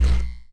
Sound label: energy, player steps; quake2